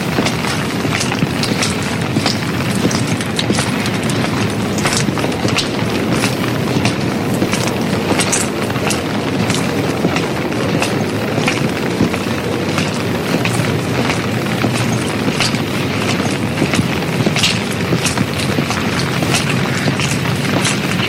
marcher sous un parapluie

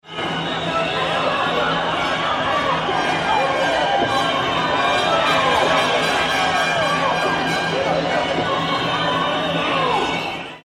manifestation

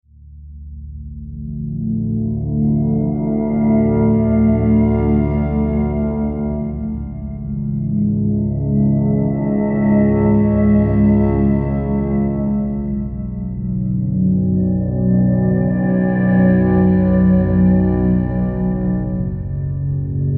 musique ambiance peur; Sound Fishing

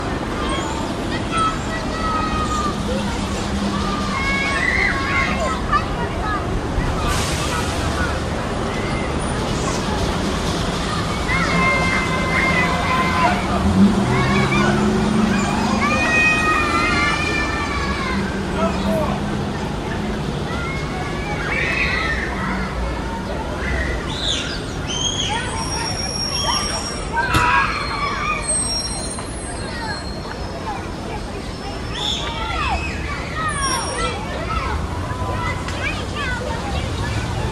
rue ambiance

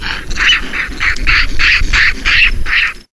macaque

